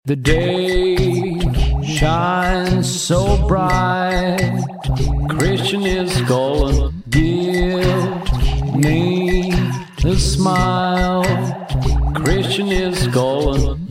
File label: oldies ringtone